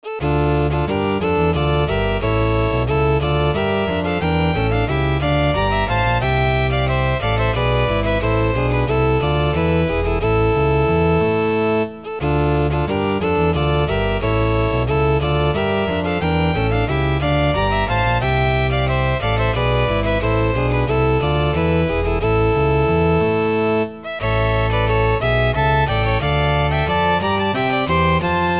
Courante

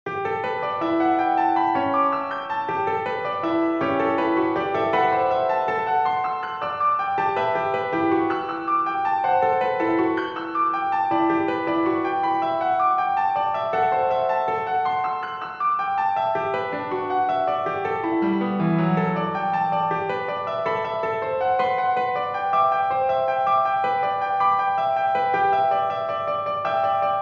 Preludes No. 11 in G, piano

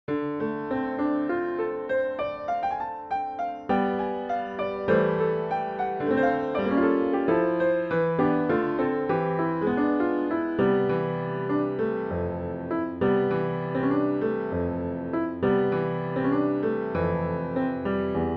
Jazz solo

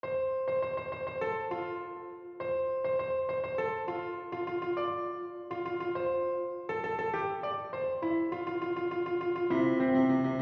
Canon, piano; W.A.Mozart; 'O du eselhafter Peierl!' KV.560a

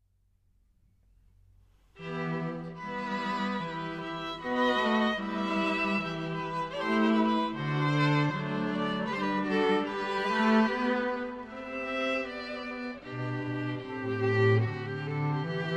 minuet

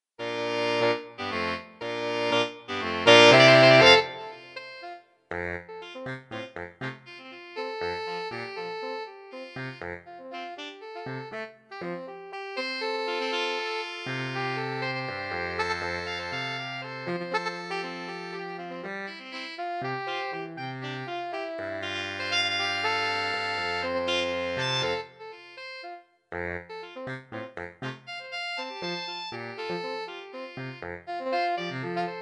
Folk Song

